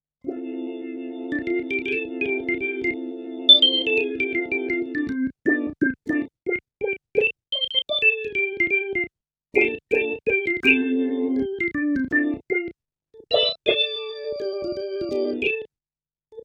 synth saeureorgel

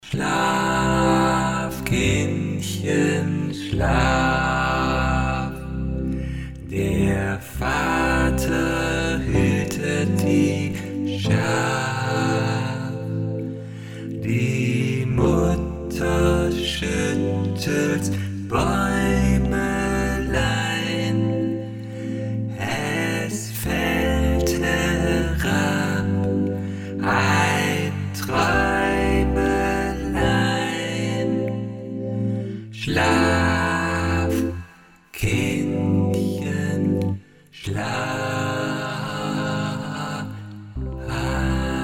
tc helicon perform ve Schlaflied

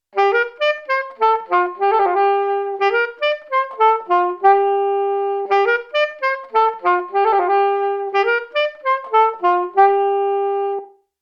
Sony DRE 2000 Saxophon Small Room dampened

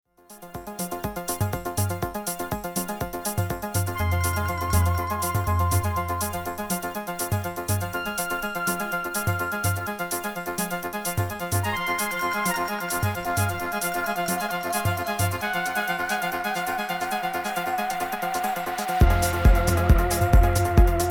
Stanton T.92 M2 USB int. Interface orig. Abnehmer Key Lock

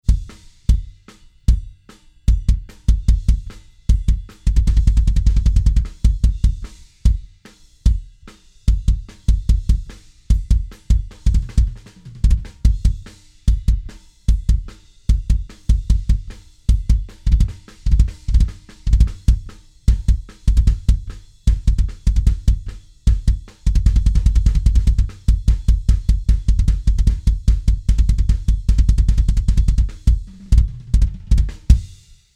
Tube Tech PE1C BD Mikro Innen LF Boost Atten 9 bei 60 Hz HF Boost 9 bei 8 kHz

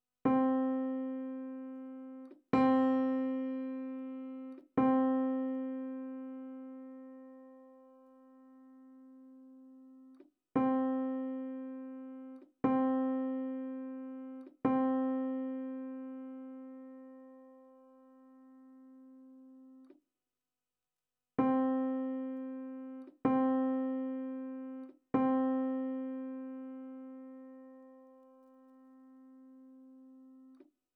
Fluegel

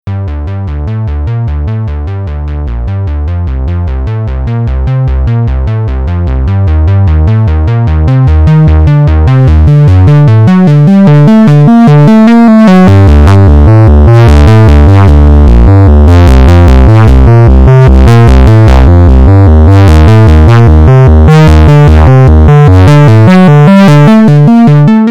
iSEM Simple Lautstaerke Verzerrung

